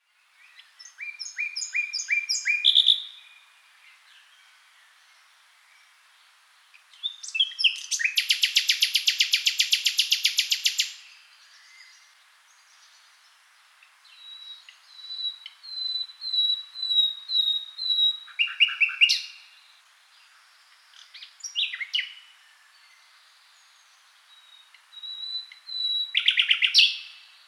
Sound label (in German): Nachtigall